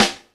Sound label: SD, HIP, Dance Mega Drums